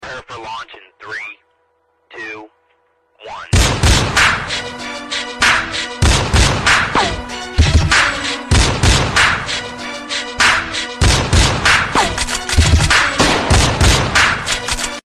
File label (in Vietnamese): ban sung TikTok